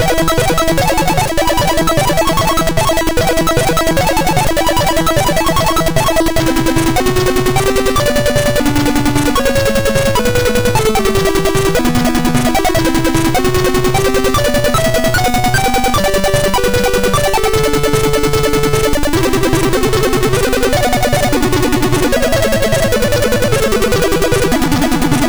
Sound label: Intro sid MOS6581R4